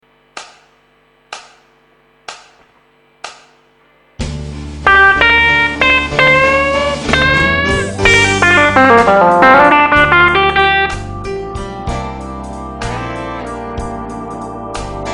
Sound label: Guitar Lick; Guitar Lesson